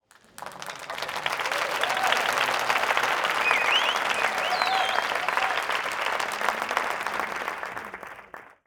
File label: enter